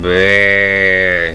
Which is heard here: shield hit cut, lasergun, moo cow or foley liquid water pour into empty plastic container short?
moo cow